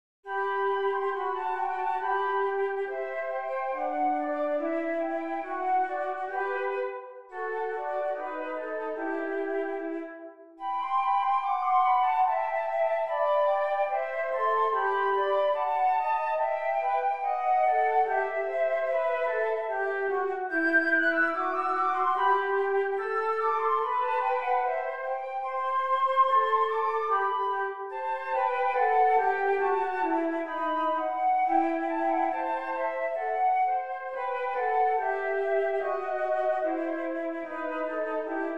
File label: flutes; queluz